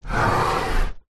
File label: household old window wooden open rub scrape